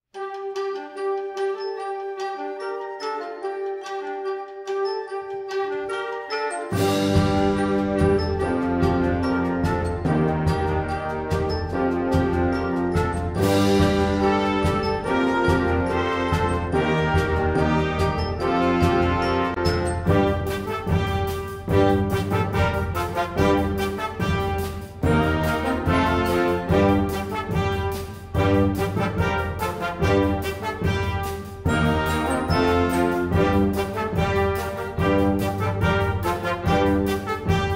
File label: Hard Rock Fanfare, Band